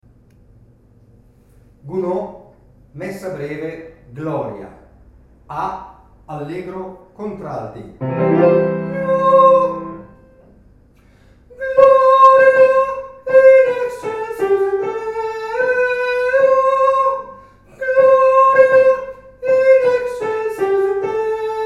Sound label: Gloria allegro Contralti